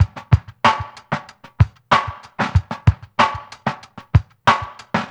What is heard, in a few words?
LO-FID; Spectrasonics; Liquid Grooves 1 & 2; SOUL BOB